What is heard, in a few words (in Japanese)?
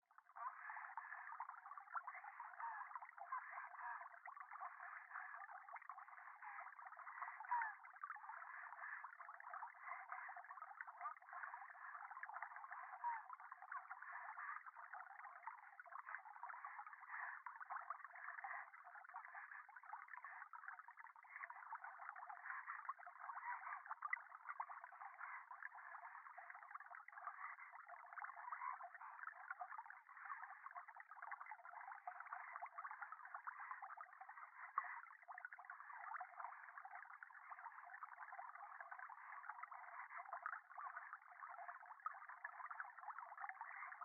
電話 右耳密着処女喘ぎ 川のせせらぎ